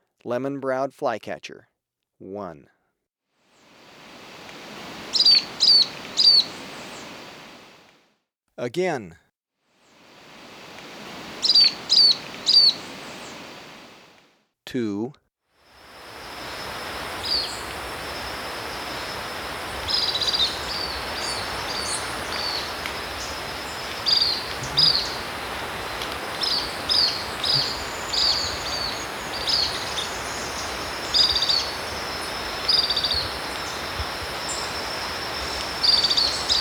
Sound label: Lemon-browed Flycatcher, Birds of Ecuador; S. America